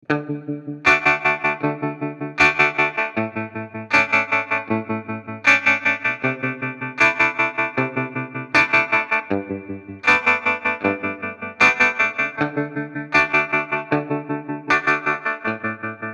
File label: MF Trem Full Shape